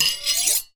knife large blade scrape hard surface or other blade